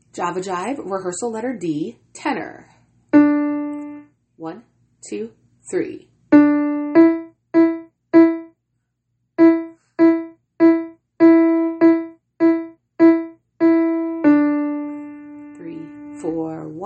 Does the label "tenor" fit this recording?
Yes